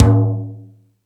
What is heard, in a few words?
Rap Session, E-MU Pro-Cussion